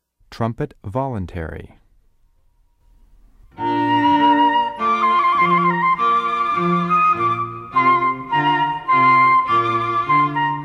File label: trumpet voluntary